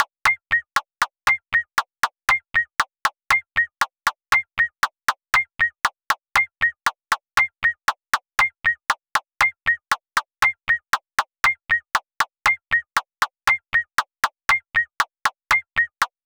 PERC LOOP; M8; percussion and foley

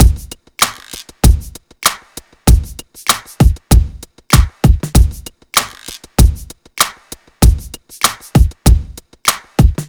beat; USB Soundscan vol.47; AKAI

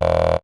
perc voice, Roland MC-909

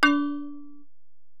Bell, Alesis DM-PRO

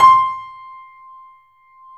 The Piano, C; InVision Interactive; BOES PLAY HARD

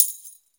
tamb

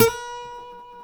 C, Martin, guitars; Spectrasonics; Hans Zimmer Guitars VOL-1; MARTIN HAMMR

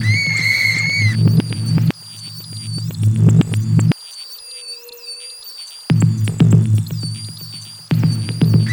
CREEPY; Spectrasonic Distorted Reality 1

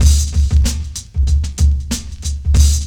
LOOP; X-Static Goldmine 2; IMIX LOOP

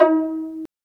Pizz, String; Vlas Pizz; Vas Pizz; Roland - String Master Series

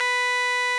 RMI; Sonic Reality - Vintage Timetraveler

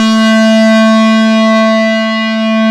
SPACE DRON, JP6 Lead; E-MU Producer Series Vol. 2 – More Studio Essentials

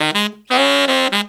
160 BPM, Horns

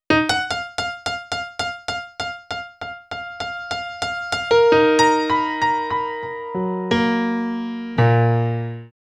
Piano Solo, Cinematic Funk; Foundry (Sony Creative Software); Cinematic Funk Urban Tone Poems; Solos

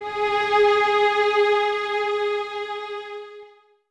Violins G#4, String Ensembles; Full; 23 Violins; Miroslav Vitous

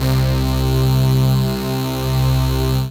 RAVE, RAVESYN

